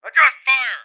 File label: oar